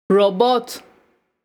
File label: robot; children